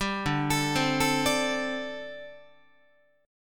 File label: D 7th Suspended 4th, down, Guitar; D7sus4; arpeggiated